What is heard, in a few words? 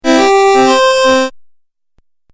Game Music Phrase, Video Game Sound Effects